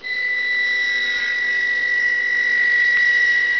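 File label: table saw; Image Bonanza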